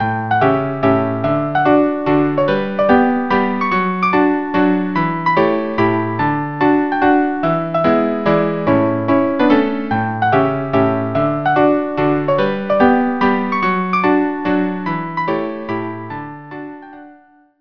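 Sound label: mazurka string quartet; copellia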